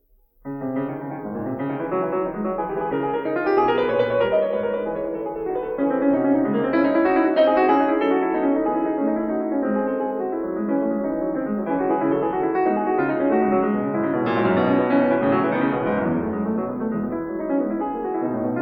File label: Fugue, C-sharp minor; BACH; WTC; BOOK II; Feinberg, Samuil